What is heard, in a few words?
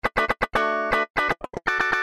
Cutting